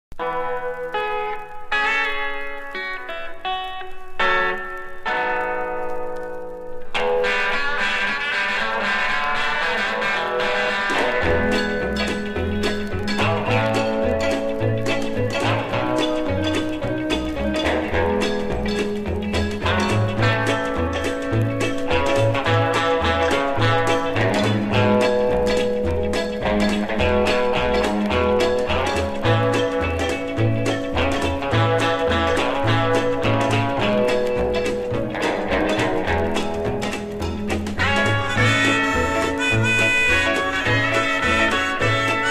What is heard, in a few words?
guitars